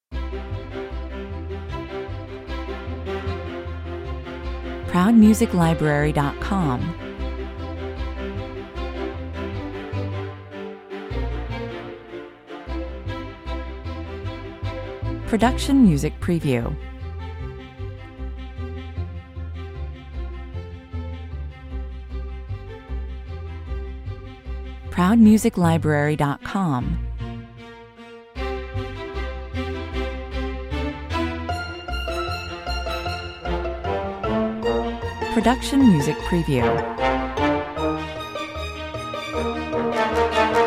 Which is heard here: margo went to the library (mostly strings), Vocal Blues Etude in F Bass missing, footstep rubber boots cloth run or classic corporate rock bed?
margo went to the library (mostly strings)